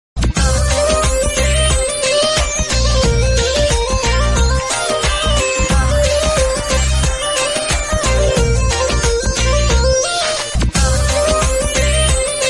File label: INSTRUMENTAL RINGTONE